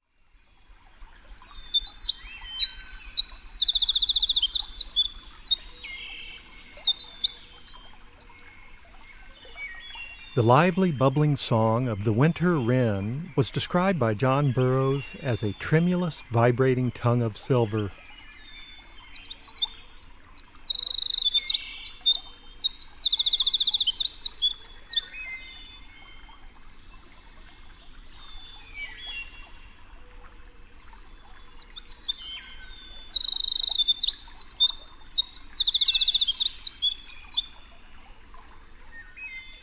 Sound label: Winter Wren; North America; Common Western Species; Know Your Bird Sounds